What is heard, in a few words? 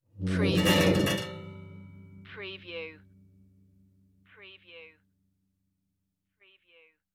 move metal furniture